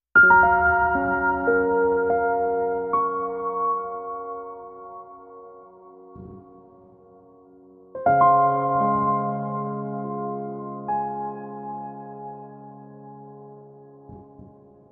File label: Ethereal Piano